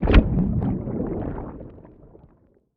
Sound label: hunting fish shoot